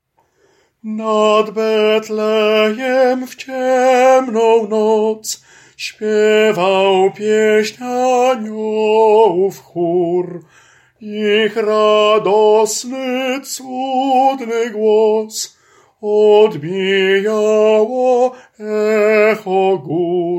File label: SOPRAN; Nad Betlejem